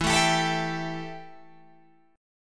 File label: E Minor Double Flat 5th E Guitar Open G